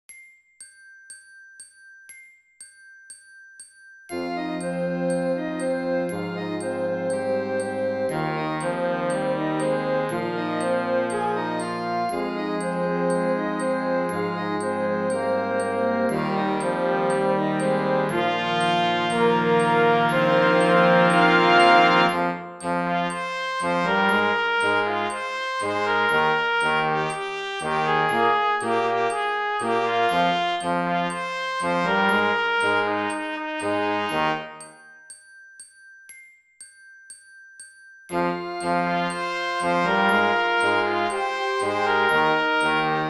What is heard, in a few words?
score w click